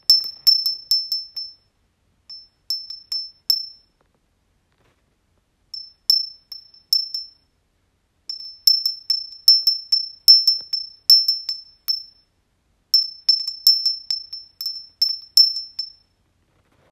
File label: windbell